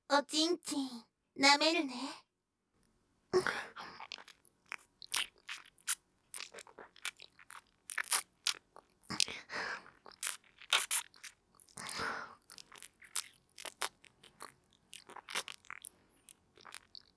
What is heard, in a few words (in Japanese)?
フェラ; うさみみの地下アイドル＋コンビニバイトBさん